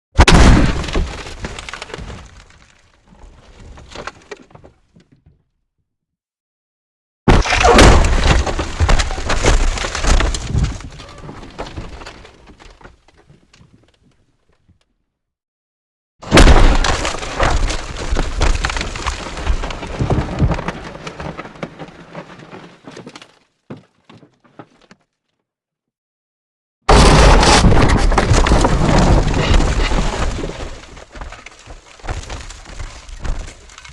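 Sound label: car destruction designed impacts interior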